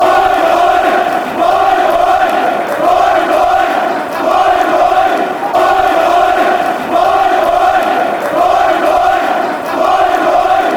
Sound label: boing boing, fanchants